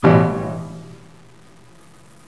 chord

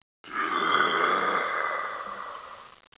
doom growl, yells

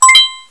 end marker

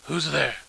alert

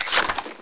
556 ar clip out, weapons

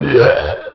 zombie pain, male

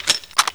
ak47 clip in

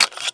clip out, weapons

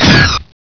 snipe bang, weapons

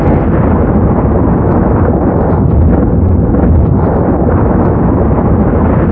thunder loop

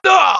MINOR PAIN; cash

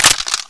m60 cover on

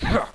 jab

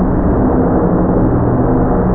radio

